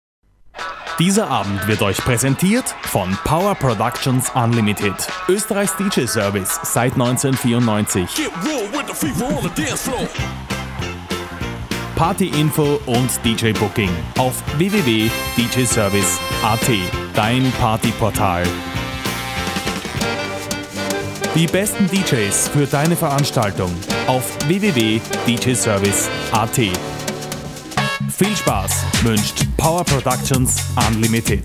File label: jingle